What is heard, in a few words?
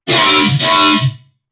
FM Bass F